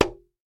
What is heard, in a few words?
wood hit; Fishing-Travel